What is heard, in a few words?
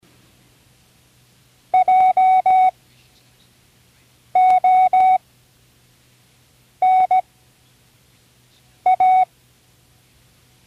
words using all letters, Morse Code; Tune in the World